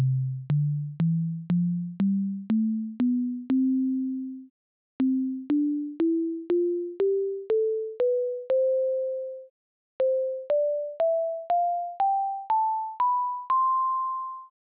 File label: Scales